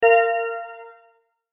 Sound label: chime bright; Multimedia; Chimes; Blastwave